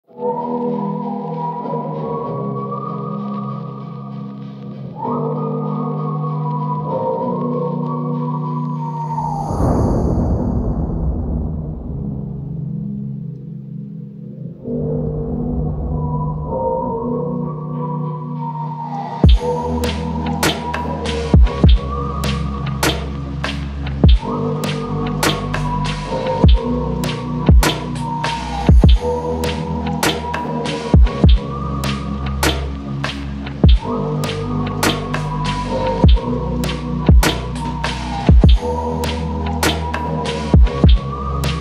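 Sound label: whistle slowed reverb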